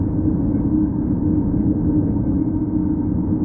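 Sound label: warehouse wood ambience; hl2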